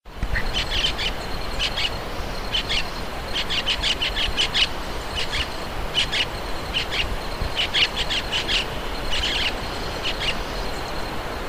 Pionus maximiliani Maitaca

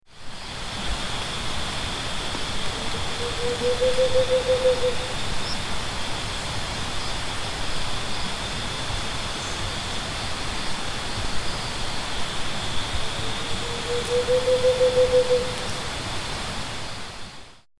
Grallaria varia Tovacuçu

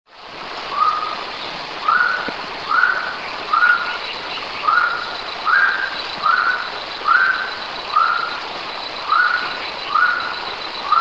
Aramides saracura Saracura-do-mato

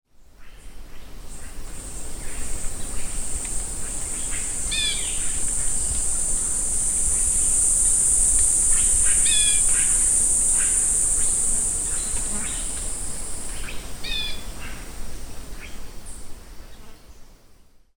Euphonia xanthogaster Fim fim grande

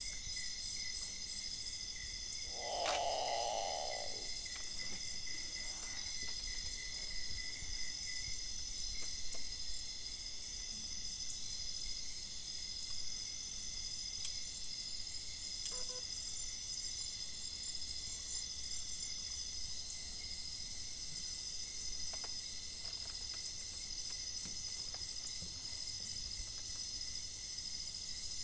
Nyctibius grandis Urutau grande

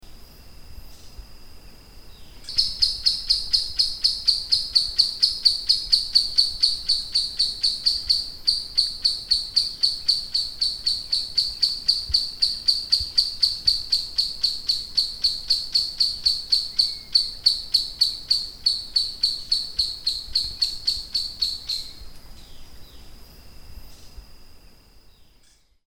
Dendrocyncla turdina Arapaçu liso

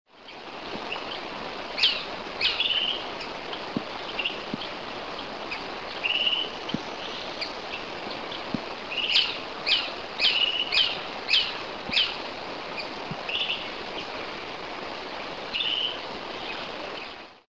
Mionectes oleagineus Abre-asa

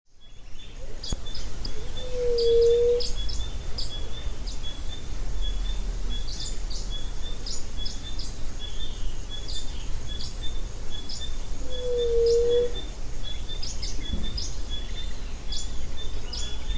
Leptotila verreauxi Juriti pupu

